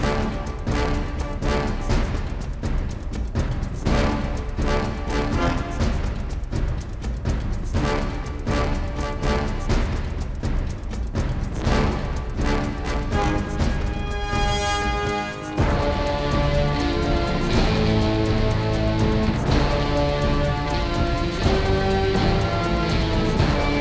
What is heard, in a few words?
fight; Godzilla Save The Earth; XBOX